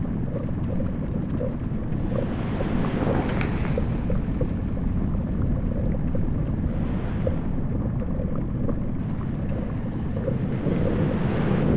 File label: ambient molten world; Stellaris